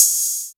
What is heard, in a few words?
HAT, Techno 138 BPM